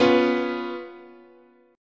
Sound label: B Suspended 2nd Flat 5th, guitar chord